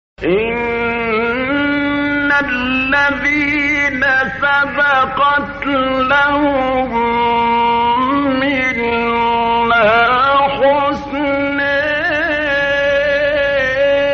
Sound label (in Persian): تلاوت آیه سوره انبیا; استاد طبلاوی